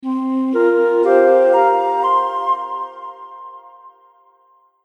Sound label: America Stinger 120Bpm; Musical Sound Effects